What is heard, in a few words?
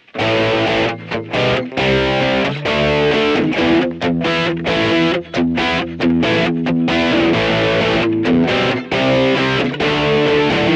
Vox AmPlug Chorus High Gain